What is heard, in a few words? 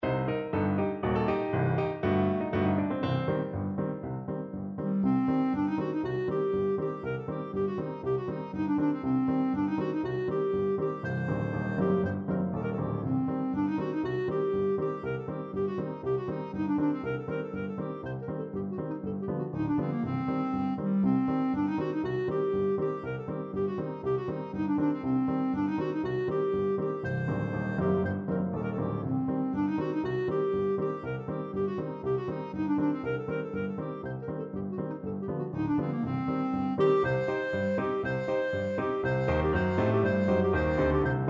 Freilechs von der Chuppe, clarinet